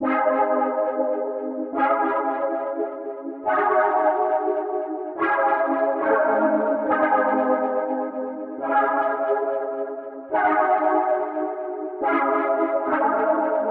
140 BPM C# Min OB-6 Chords; Stems; Cymatics; Kaleidoscope

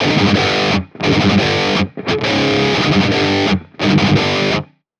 Metal Guitar Loop, 174 BPM G Maj; Cymatics; Vibrations Guitar Sample Pack

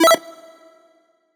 Riddim Melodic Hit E; One-Shots; Ghosthack; Riddim Essentials Volume 2

